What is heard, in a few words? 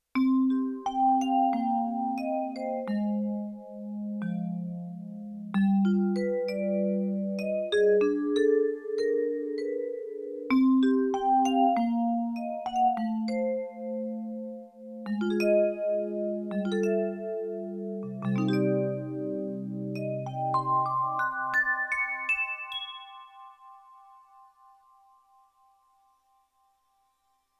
Chorus & Non-Tremolo, Vibraphone, Yamaha TX16W